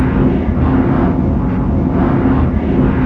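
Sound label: Engine Thrust Interior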